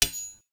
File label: Knife Clash; soundscrate